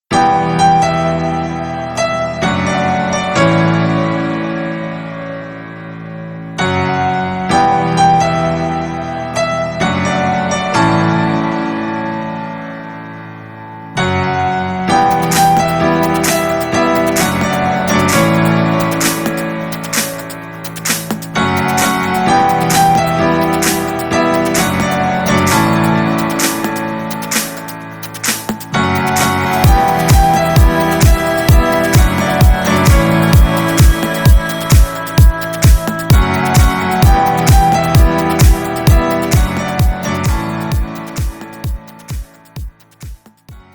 Random piano is random

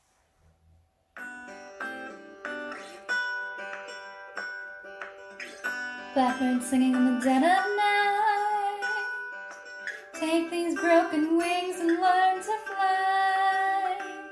[F] Song

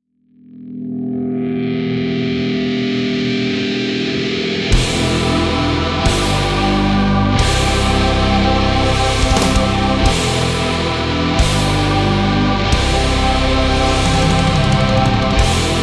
instrumental

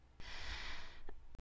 Breath; Lewd SFX

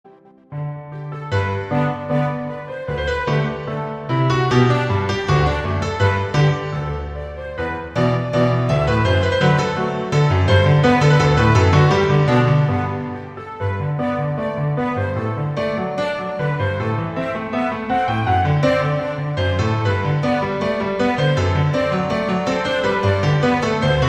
Orchestral Version

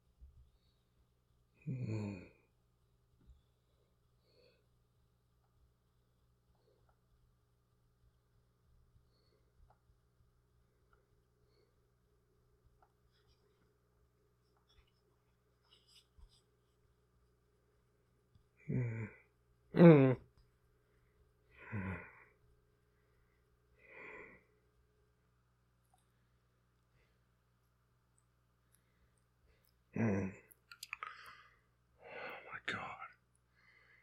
bonus squishy noises

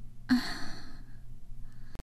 Moan

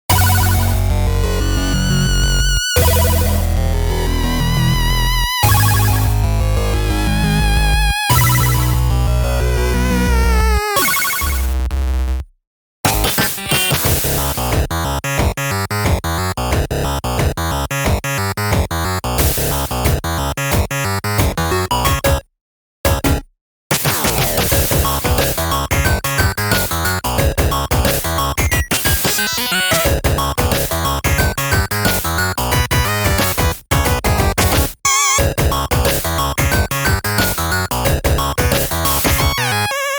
8-Bit; Portfolio Tracks

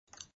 Suck; Lewd SFX

